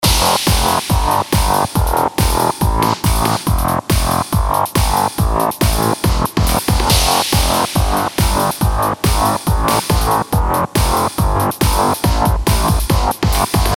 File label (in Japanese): シンセ 音作り サンプル ベース DD